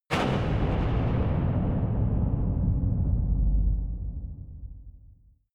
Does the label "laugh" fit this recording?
No